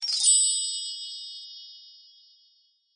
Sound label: Magic House Hat